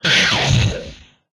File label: Explosion, retro arcade; MMRetroArcadeSoundsPack